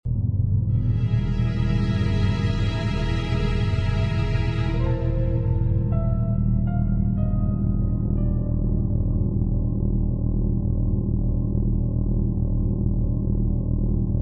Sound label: music